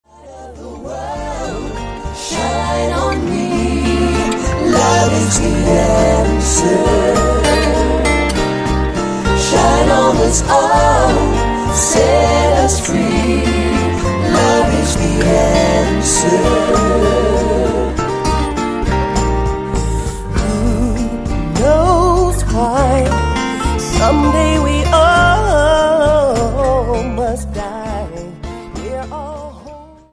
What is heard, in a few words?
acoustic